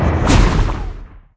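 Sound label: trap poison spawn; monster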